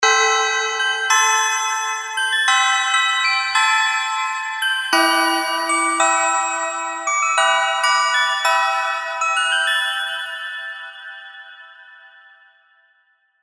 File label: Gentle Rain, keys; revealed diva keys vol 2